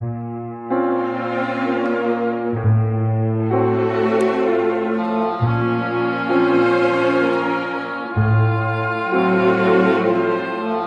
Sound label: strings, Sad Loops